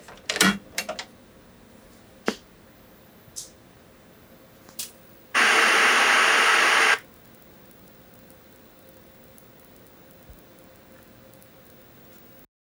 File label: CRT Turn On; SFX; IRL Recorded